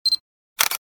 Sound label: Camera shutter click autofocus with flash